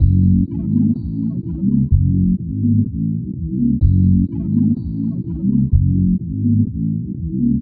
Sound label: 126 F# COMBI GROOVE, funky; Loopmasters; Funky Underground Vol 2; COMBI GROOVES